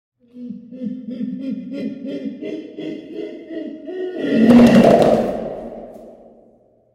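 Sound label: gorilla voice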